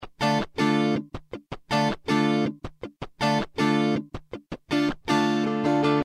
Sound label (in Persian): ریتم گیتار آکوستیک